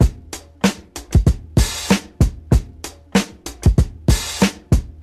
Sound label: 96 bpm breakbeat sample b key, Free drum loop sample - kick tuned to the B note